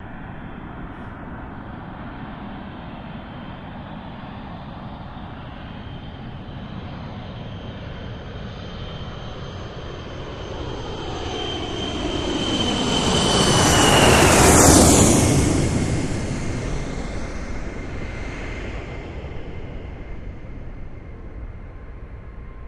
Airport Lot Fly Over, overhead bys

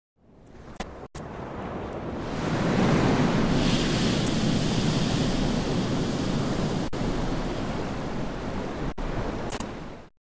Waves Single Wave Cra

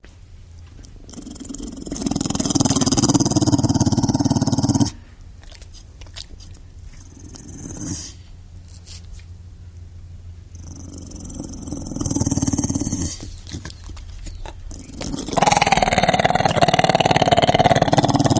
Pit Bull Threatening

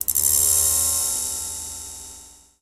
Clicks Multi Tapped

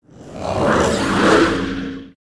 Monster Combine, monsters

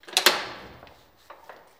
Jail Latch Click Amb, latches & bolts

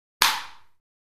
Ping Pong Paddle Hit

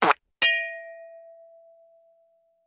Cartoon Raspberries, vocals

